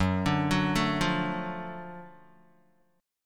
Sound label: Gb Minor Major 7th Sharp 5th Gb down Guitar; arpeggiated; JGuitar